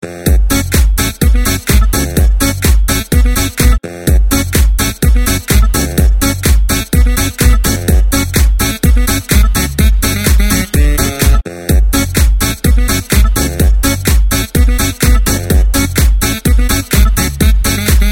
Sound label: Trompet